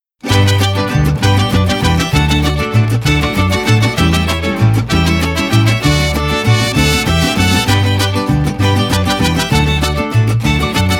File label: Latin Traditional mix